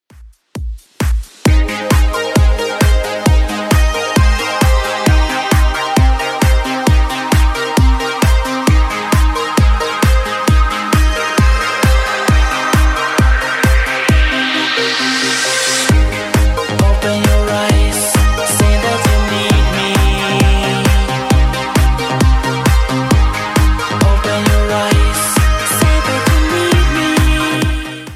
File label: 80s Redrum 133bpm